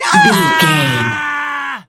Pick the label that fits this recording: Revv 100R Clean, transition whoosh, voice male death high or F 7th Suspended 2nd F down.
voice male death high